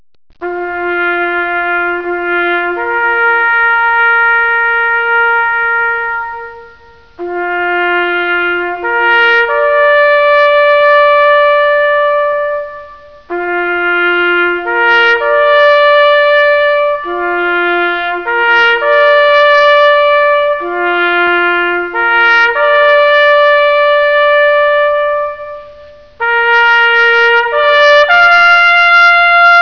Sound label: TAPS